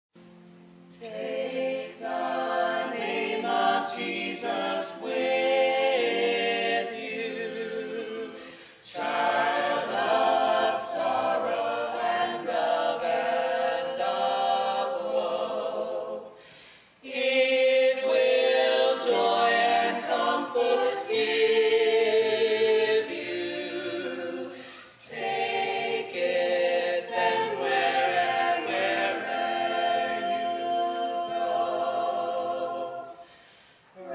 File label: acapella benediction